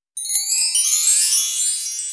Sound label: buff up